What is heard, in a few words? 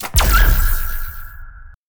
skill archet shot